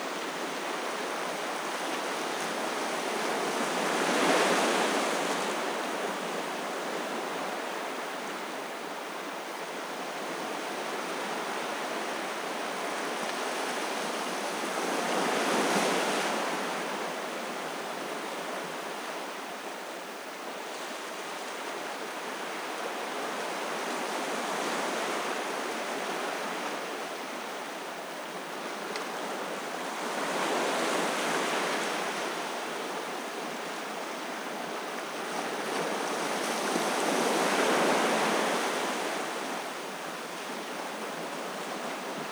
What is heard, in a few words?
gentle waves beach